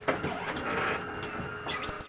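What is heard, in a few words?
front door close; volvo 9900; Freyfurt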